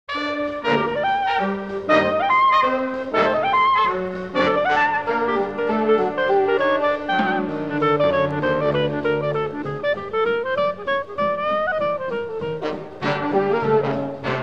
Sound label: Clarinet